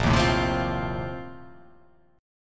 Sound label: A sharp Major 7th Suspended 2nd Suspended 4th